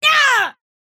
pain big

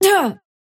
pain small

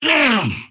pain big

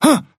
effort melee small; Trapper

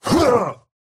effort melee small; Slork

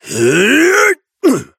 effort melee big; Operative

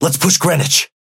synth ping push

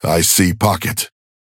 ping synth

